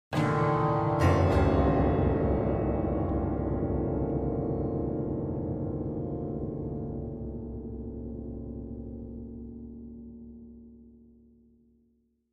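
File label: Grand Piano Strings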